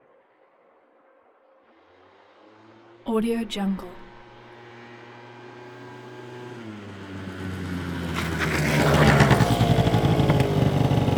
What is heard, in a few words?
scooter approach brake pullaway; soundbits-soundfx